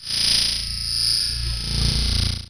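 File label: ambience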